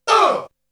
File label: Vocal; ZERO G - Datafile DISC 2